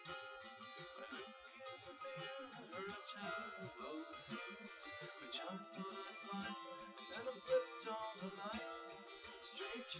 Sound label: nodrums liverec 250ms 5cm sim